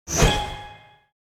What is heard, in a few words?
Designed whoosh transition